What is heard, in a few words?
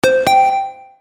Melodic Notification